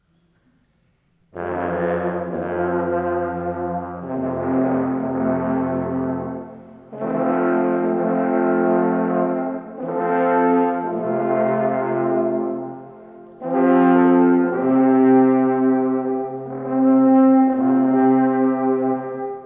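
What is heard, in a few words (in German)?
Alphörner Alphornruf; Konzert 2024 “ Mit Musik durchs All“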